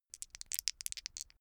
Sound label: dice shake hands open short